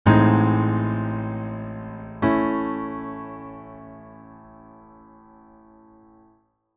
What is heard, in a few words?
Ab over A to A